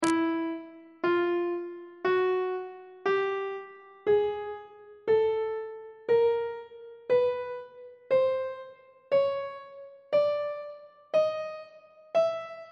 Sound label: f flat dorian mode chromatic scale 1 octave on treble clef